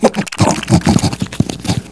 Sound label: Gasp; scourge; area51